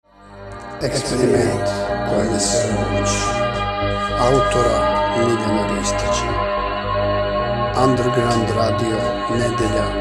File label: Jingle; eXperiment